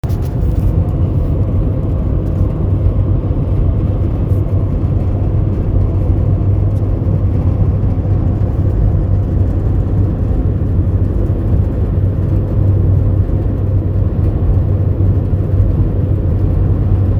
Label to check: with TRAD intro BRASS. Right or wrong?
Wrong